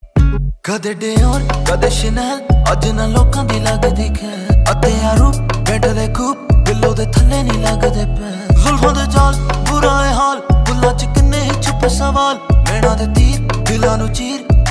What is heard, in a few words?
Ringtone